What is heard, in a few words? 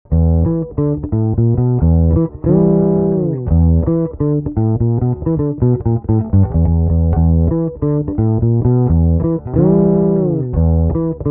Fretless Drive Comp EQ